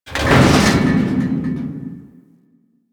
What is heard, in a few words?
Submerged Metal Barrel; Ambience; One Offs; Warframe; Quests; The New War; Drifter Camp